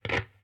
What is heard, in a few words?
Crackle, Radio